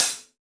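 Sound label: Standard Closed Hi Hat alt, Roland BK-5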